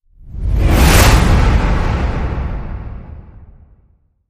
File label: High Speed Whoosh